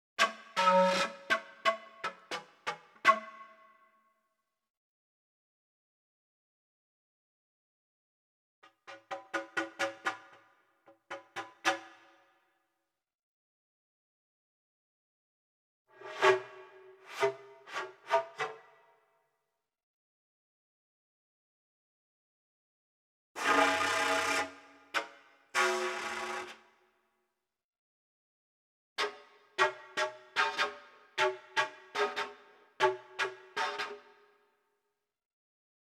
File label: toyo phrases; impact soundworks